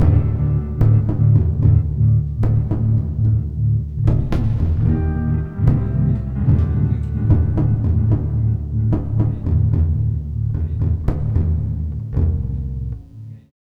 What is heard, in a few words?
Full Mix D, Lounge; Zero-G Kocktail Kollection; Construction Kitz (Lounge); Volcano at Sunrise 074